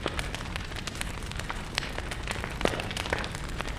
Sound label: Burn Crackling; Hidden Deep